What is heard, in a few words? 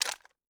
ui drop gun; Rust